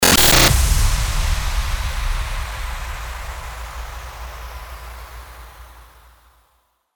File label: FX STARTER IMPACT